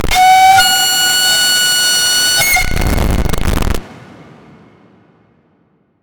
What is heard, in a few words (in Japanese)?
和ホラー